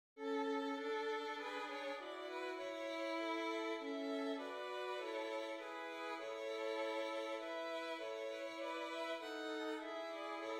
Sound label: Violins; Hymn